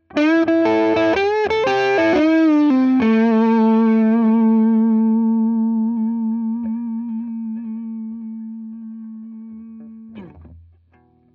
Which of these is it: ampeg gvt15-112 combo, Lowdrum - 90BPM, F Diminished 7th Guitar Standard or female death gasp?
ampeg gvt15-112 combo